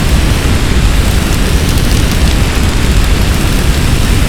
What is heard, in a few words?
flamethrower fire; weapons